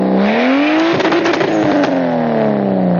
1JZ Supra BOV

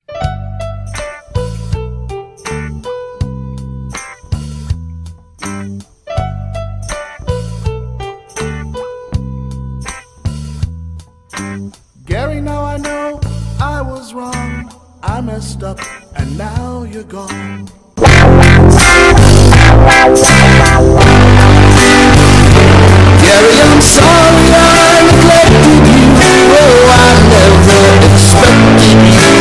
song alarm

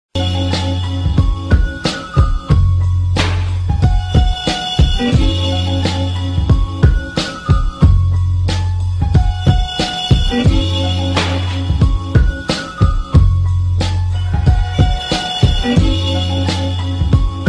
instrumental

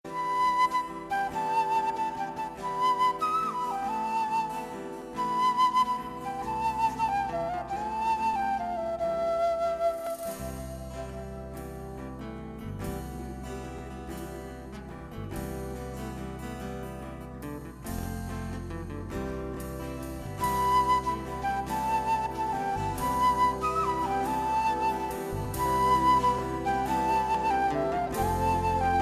sweet flute

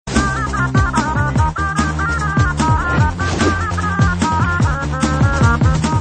shehnai msg; ringtones; sms

